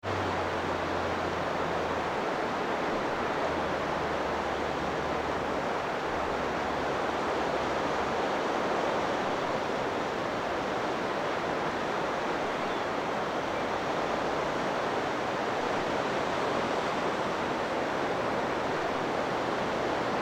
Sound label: wave